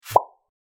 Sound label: message sent